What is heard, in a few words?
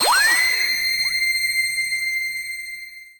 divine light shining through